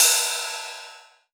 Rides, Cymbals

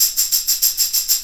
Tambourine

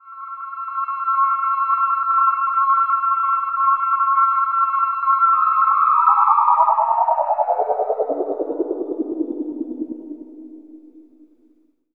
synth fx; Zero G; Malice In Wonderland

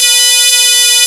BELL C4

